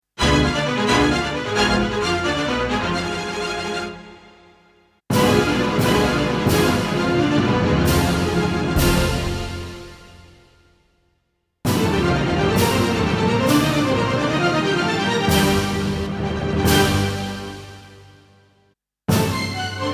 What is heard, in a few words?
New PADs; Demo Styles